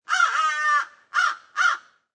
Crow Caw Multiple, A single crow caws three times, once long and two short.; Birds; Animals